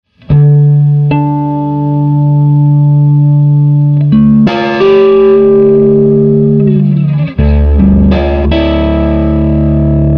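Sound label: Tele overdrive, Nocaster Tele; Swart AST Pro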